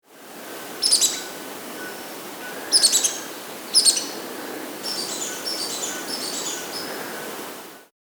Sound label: Fawn-Breasted Brillant (Heliodoxa Rubinoides), Andean Birds; S. America